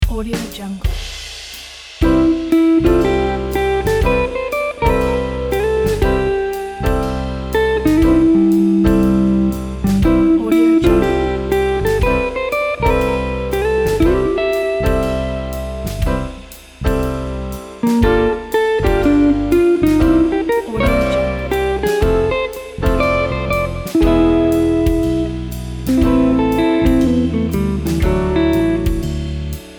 uplifting commercial jazz

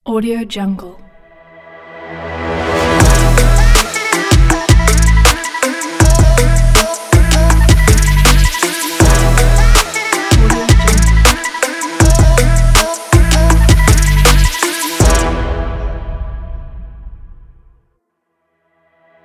stylish trap action intro logo

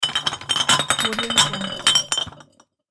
glass bottles clinking

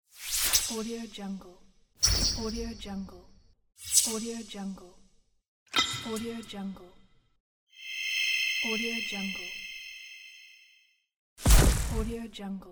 magic spell game pack; tibasound

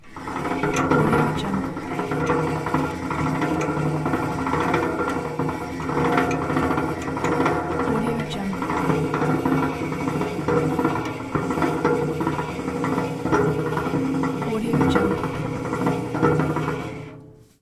rolling metal crane mechanism; urbazon

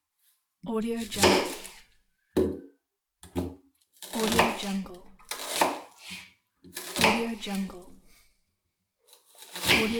slicing vegetables a knife

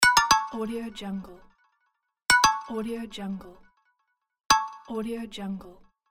wrong option

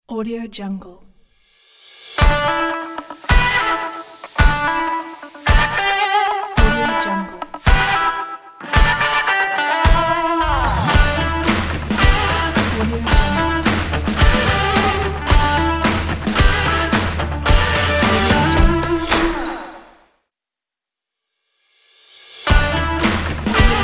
vintage indie logo; fox-rock

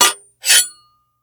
sword hit; prosoundfx

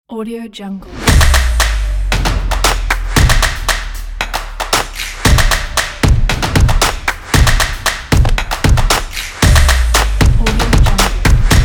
epic claps logo

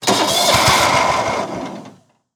lawn mower stall; prosoundfx

